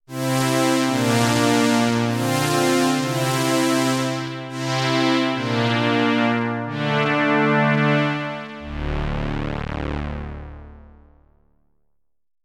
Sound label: Bright String